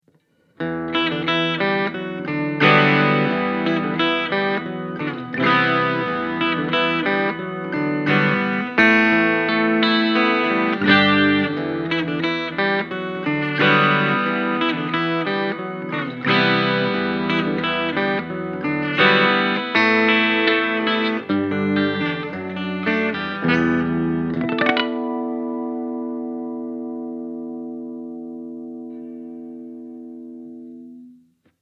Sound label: 12 String Clean Bridge